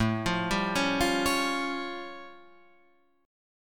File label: A Augmented 7th A; x,12,15,12,13,12,15,x